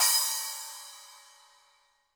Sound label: HiHat & Crash, Roland TD-20; HiHats